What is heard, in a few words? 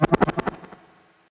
creature laugh; Gonner